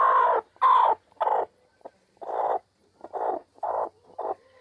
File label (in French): cri poule qui couve